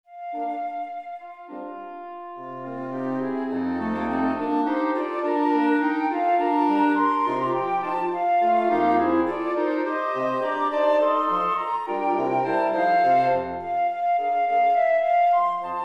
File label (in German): blaeserquintett